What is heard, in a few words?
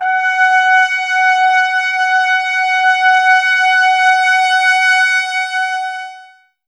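brass trumpets F; 90 s Sample CDs; Miroslav Vitous; Woodwind Brass Ensemble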